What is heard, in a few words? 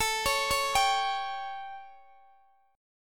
A 7th Guitar Open D, chord; x,x,19,19,16,17